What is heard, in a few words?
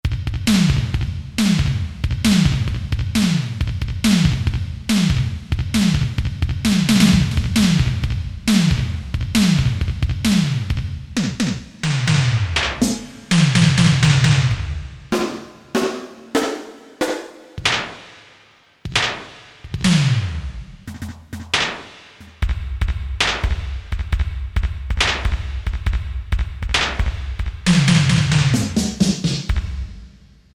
SIMMONS, Percussion, Korg DSS-1